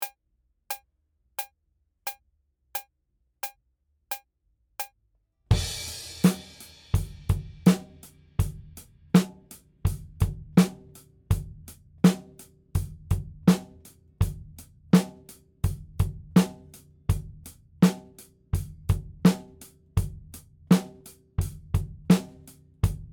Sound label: Drums ONLY; Pre Pro